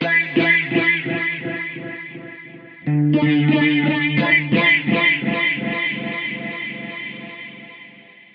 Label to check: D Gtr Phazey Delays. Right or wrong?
Right